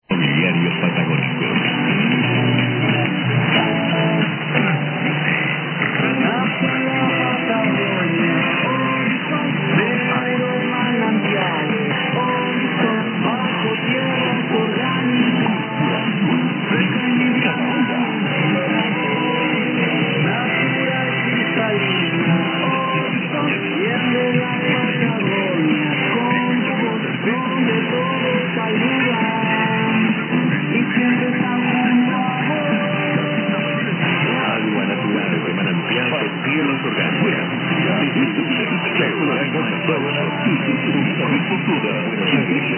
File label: Jingle; Radio Nacional Patagonia; Bariloche